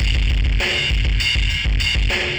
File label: ind dist ride